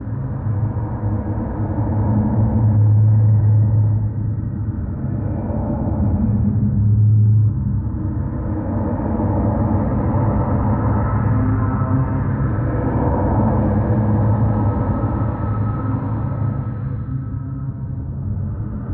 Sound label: corridor, ambient atmosphere